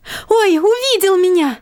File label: Diner Emote; Light Street